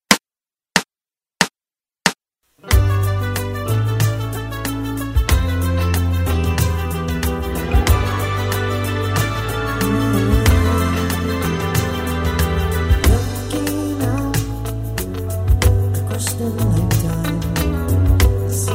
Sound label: band reh